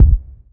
kick single hit f sharp key; kick drum; kick drum samples

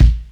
boomy steel kick drum sample a key; kick drum samples

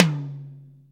hi tom one shot c sharp key

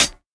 light rap high hat sound d sharp; hat samples

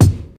clear kick drum single hit g key

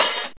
old school damped open high hat sample C key

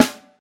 fluffy acoustic snare b key

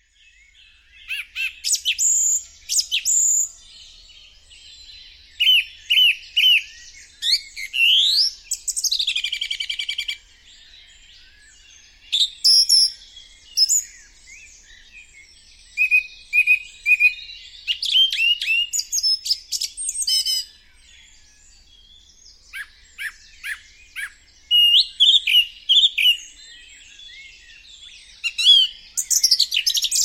song thrush singing

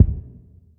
small reverb steel kick drum sound F sharp key

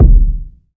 subtle reverb kick single shot D key

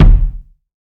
rich low end kick single hit D# key; kick drum

